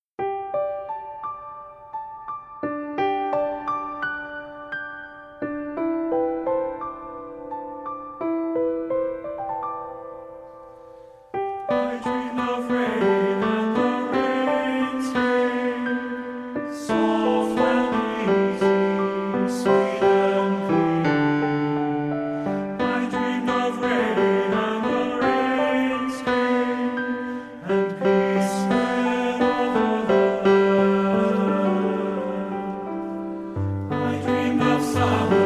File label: i dreamed of rain tenor